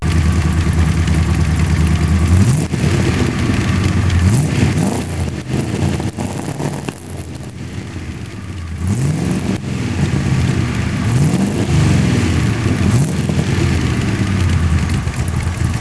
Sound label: twin, v8 mini